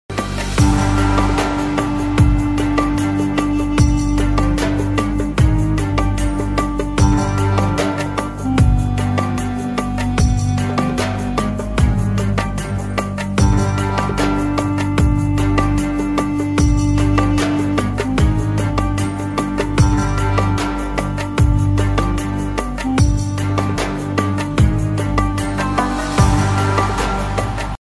Egyptian music; موسیقی مصری بی کلام

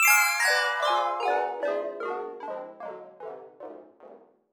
retro lose game over; mixkit

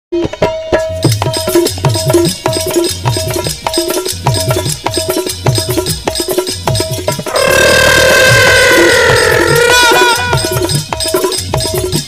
Indian drums; کوبه های هندی

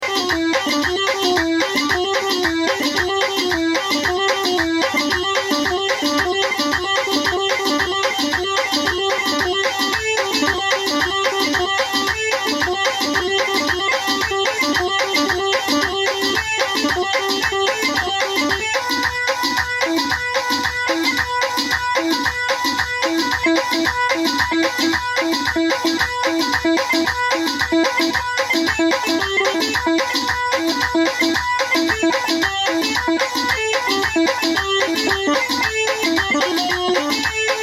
Lianic, ساز

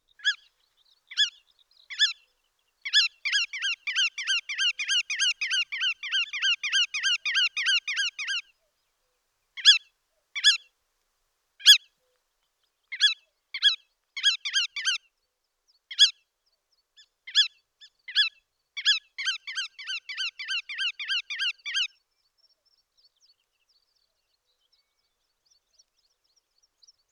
Bar tailed Godwit

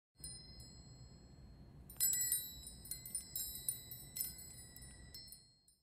chandelier

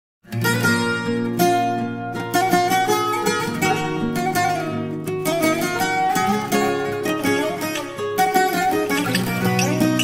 Bouzouki; ساز بوزوکی

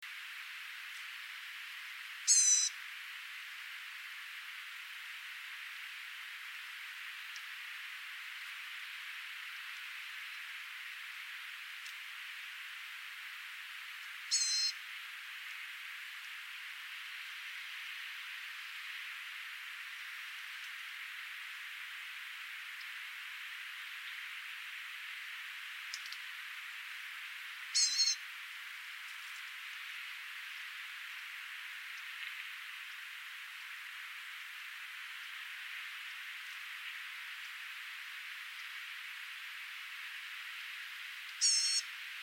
Blue gray gnatcatcher

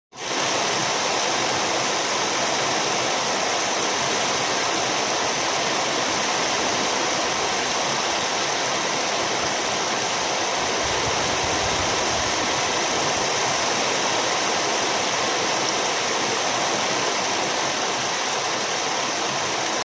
rain noise

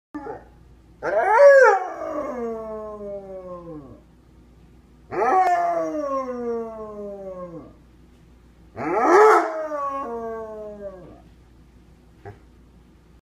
Basset Hound; سگ باست هوند

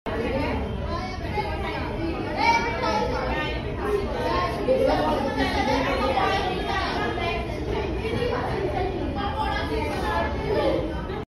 School administration hall; سالن اداری مدارس

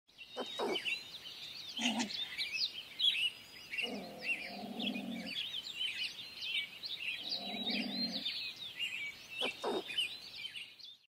European pine marten; سمور جنگلی

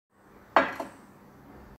Put glass on table; گذاشتن لیوان روی میز

